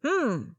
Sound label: pick